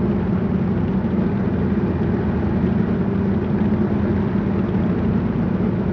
motorcade; World of Tanks Blitz; hangar 9 may

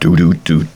test music

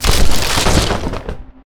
objects, World of Tanks

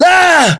pain

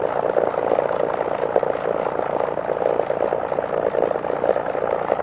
engine ai plane rpm 00 far; War Thunder; engines

